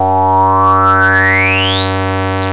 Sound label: Analogue PAD; Instruments